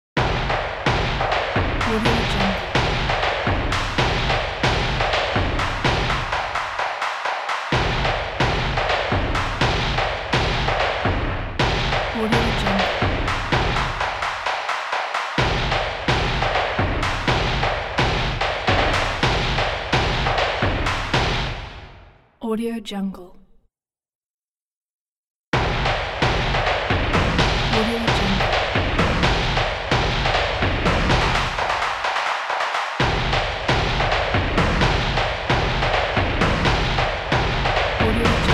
drums sport ident

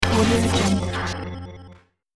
robot sound control mechanism

